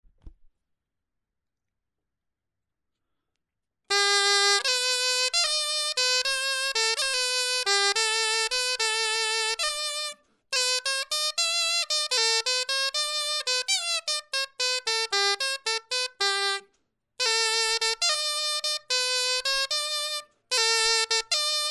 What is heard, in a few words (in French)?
clarin etude